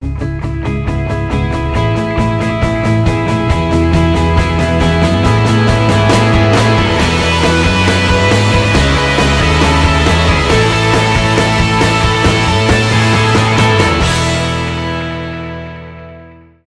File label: Uplifting Energetic Intro; MIUI12